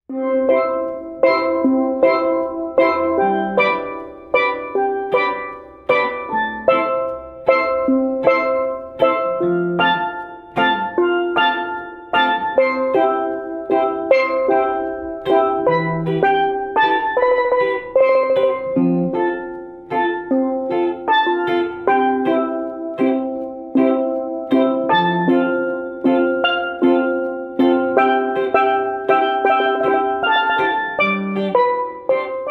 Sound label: steel drum And guitar